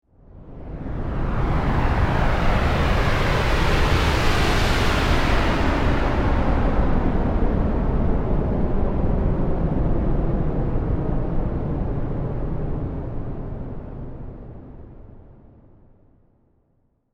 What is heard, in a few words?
Jet Transition, Passing Jet engine transition.; Airplanes (Sound Effects:Vehicles)